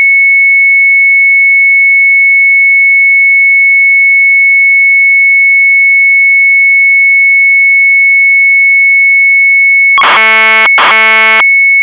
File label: MZ800 Tape; retromelsarchive; sharp mz800